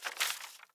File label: ui drop paper; Rust